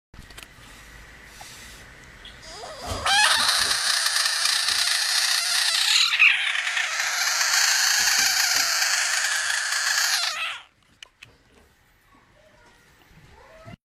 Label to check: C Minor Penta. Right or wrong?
Wrong